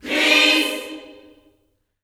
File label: PEACE chord, Gospel; Spectrasonics; Vocal Planet Vol.1 Gospel; akai